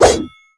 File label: ShortBlade swing